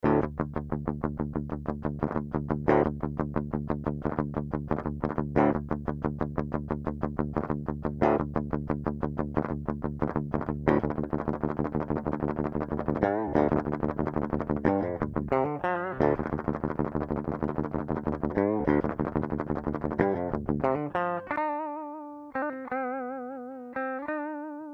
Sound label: Fishman Fluence Modern V2 passive